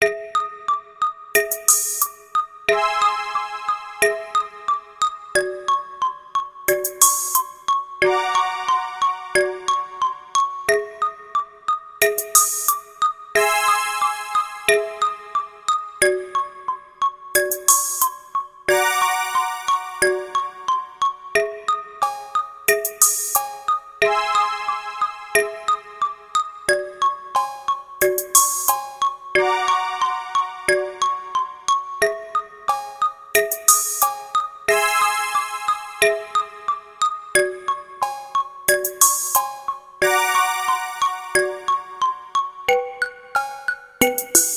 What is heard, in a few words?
Comical Suspense